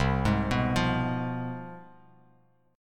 C Major 7th Suspended 4th Sharp 5th, C, down, Bass Standard; arpeggiated; CM7sus4#5